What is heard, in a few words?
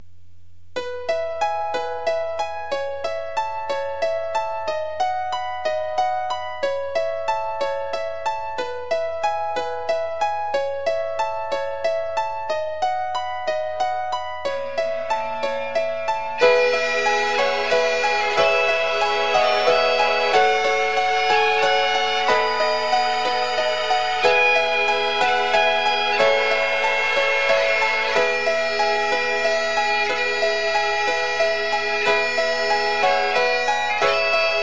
Skye Boat Song, bagpipes